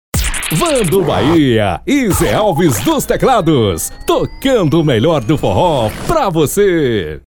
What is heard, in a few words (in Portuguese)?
abertura